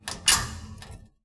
panel unlock; svencoop; twhltower

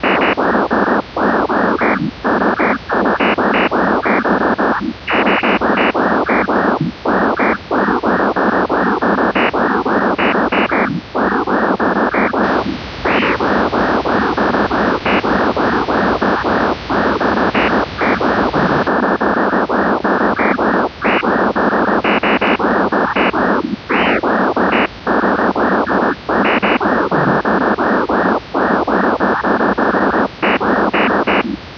comp idle; svencoop; twhltower

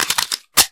pistol deploy; weapons; svencoop

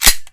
deagle slide forward; svencoop; weapons

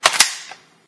m16 magin; weapons; svencoop

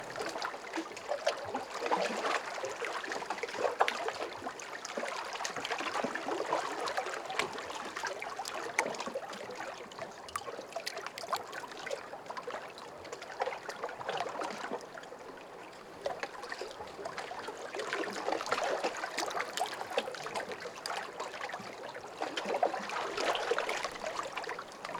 water loop; svencoop; twhltower

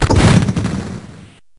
rpg launch; weapons; svencoop

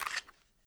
sniper mag out; svencoop; weapons

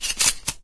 shotgun load, weapons; svencoop

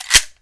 clip in; svencoop; weapons

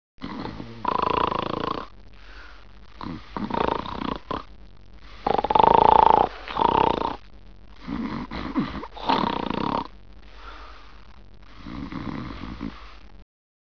men snoring; ambience; svencoop; azuresheep

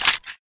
usp mag out; svencoop; weapons

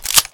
revolvers wheel in; svencoop; weapons

